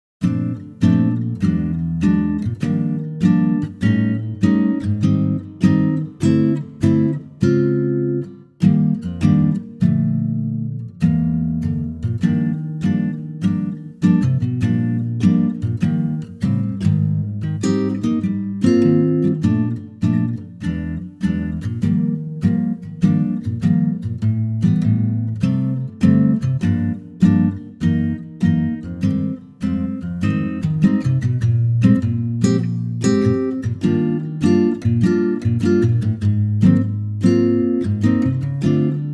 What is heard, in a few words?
Ac Gtr Bb; key - Bb - vocal range - G to D